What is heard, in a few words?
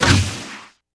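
Space Station Thrust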